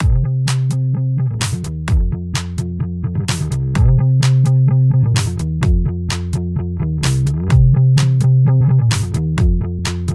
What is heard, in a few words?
song; vex4